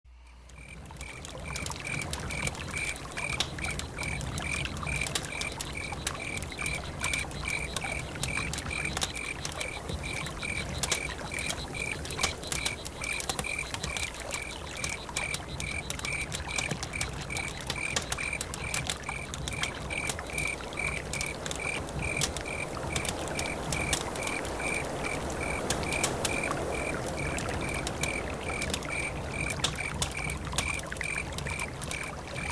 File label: crickets